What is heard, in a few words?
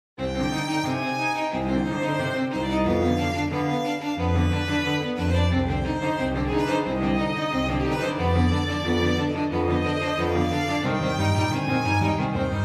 string quartet